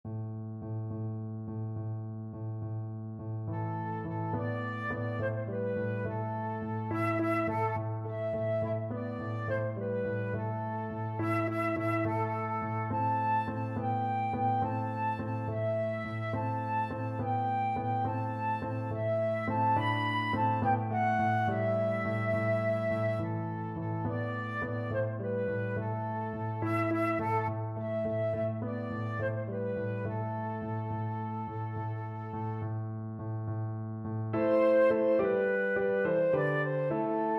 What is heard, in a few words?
flute